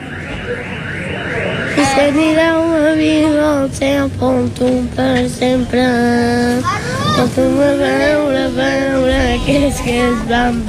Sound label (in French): Chant gitan